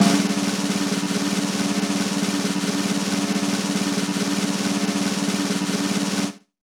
Rock Snare Roll, Roland BK-5; Snares

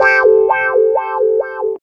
Clavinet; Ambient Grooves

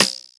Rimshot; Equipped Music; Loungin House

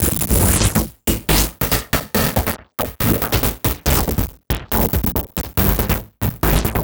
Envelope Beat; Drums & Drones

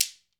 GHANA SHK; World Combo

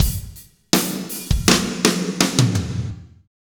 Drumscapes Roland, Pwr Ballad; Northstar

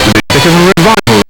SEARCH LOOP, Big Beat; Big Beat Samples & More; AKAI; ALL LOOP KIT

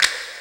TEDDY SNAP, Techno Trance; E-MU Formula 4000 Series Vol. 2

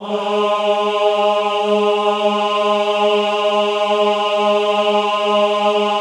AHH G2, choir; Best Service; Extended Classical Choir; AHH MIX

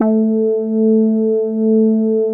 BIT BASS A2, CRUMAR BIT01, Vintage Keyboards; Big Fish Audio - First Call Vintage Keyboards

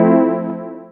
DR. LOOP; X-Static Goldmine 3; CHORDS

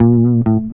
REAL BASS; Ueberschall; Jam Box

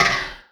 Percy Hit, FX

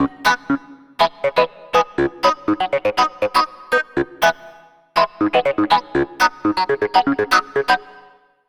Clavinet; Dr. Fink's Funk Factory

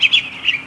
BIRD; AKAI S-Series CD-ROM Sound Library VOL-8; SFX

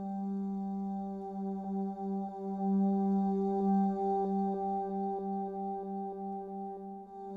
Kelele, Kelele Drones; World Percussion

